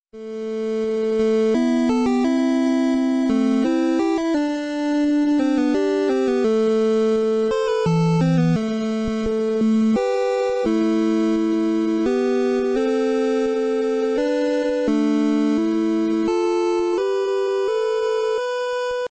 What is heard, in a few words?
8 bit; overwatch